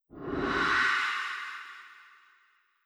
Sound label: Morale